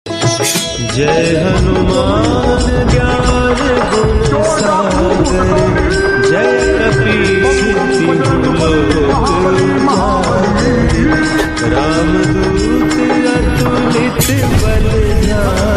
ringtone instrumental song

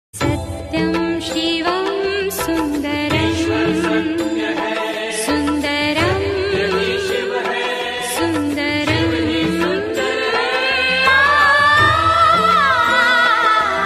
bhakti ringtone; mahadev

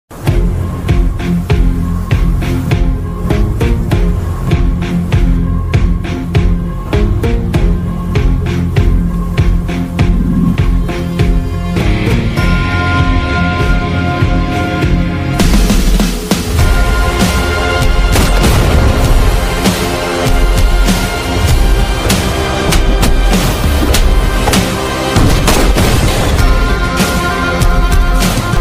tamil bgm ringtone; captain miller trailer

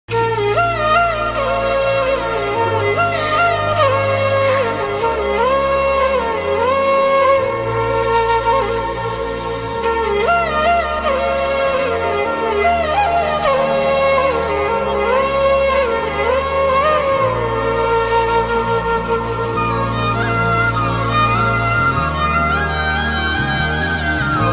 full ringtone only music no voice; Jaanu

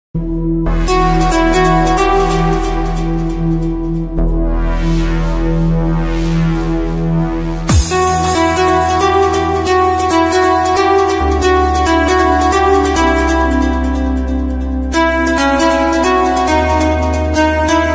instrumental ringtone

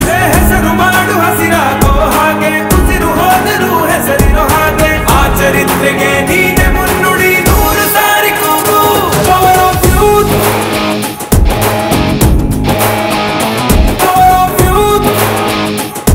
Power Of Youth Ringtone Kannada; Yuvarathnaa

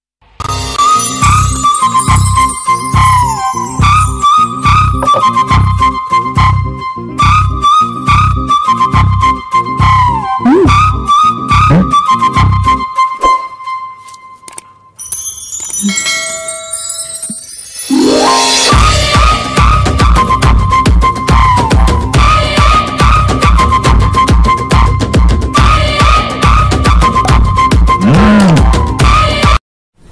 love bgm ringtone; zombie reddy; tej sajja

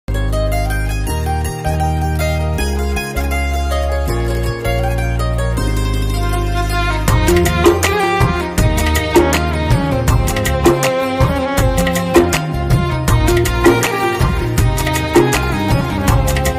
instrumental ringtone

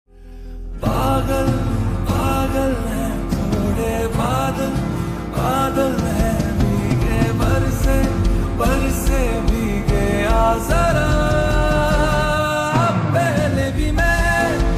song ringtone; animal movie

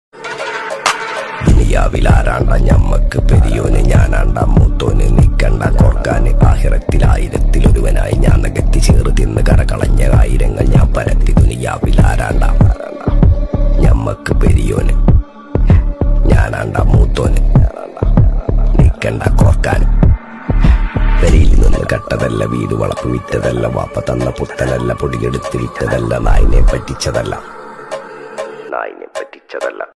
ringtones malyalam song

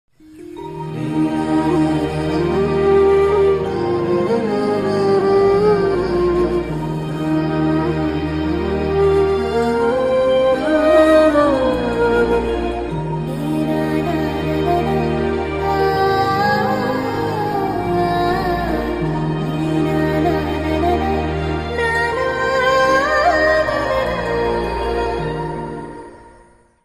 sad bgm; ashoka vanamlo arjuna kalyanam movie; vasudha; vikwak sen; rithika nayak

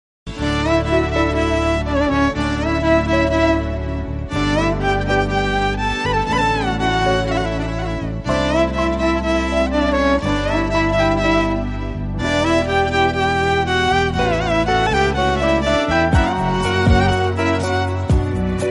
bgm instrumental ringtone

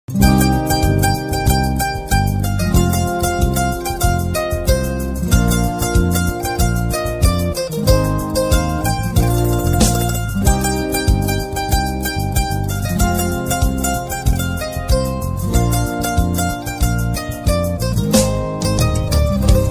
telugu movie ringtone; ramcharan

